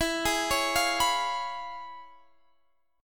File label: C sharp 7th E; C#7/E; arpeggiated